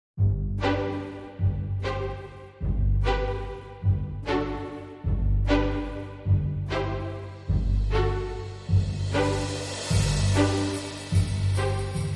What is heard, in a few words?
Ice Skaters Waltz